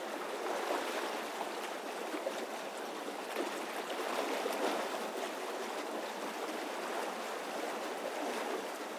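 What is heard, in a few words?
ambience boat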